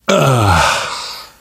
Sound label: pain relief sigh; source engine; left 4 dead 2; namvet